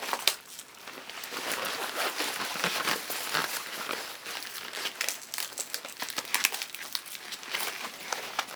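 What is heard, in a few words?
sporeplant movement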